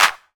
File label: good hand clap sample b key, Royality free clap sound tuned to the B note.